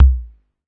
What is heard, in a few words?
Yamaha TX-81Z BD; drum machines